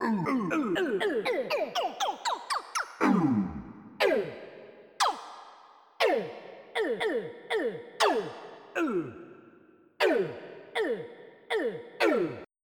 hit; Populous Demo

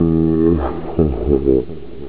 laugh, speech

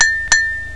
DING DING; PC World Komputer 1998 April A; TALKNOW; MEMGAME

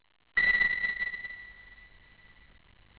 timer; Geschicklichkeit; Enigma

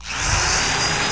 exclam

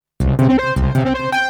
D+B 160; eJay

